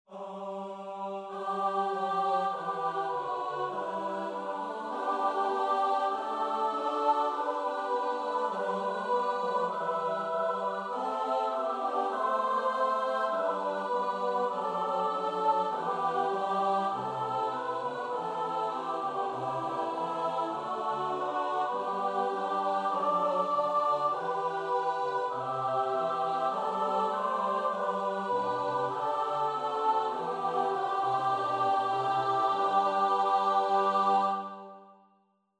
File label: midi; ku tobie panie